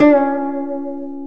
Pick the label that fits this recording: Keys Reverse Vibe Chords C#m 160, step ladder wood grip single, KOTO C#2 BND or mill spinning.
KOTO C#2 BND